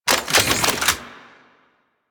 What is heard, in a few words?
Arch Burst Gun Equip, Weapons; DE; Warframe; Archwing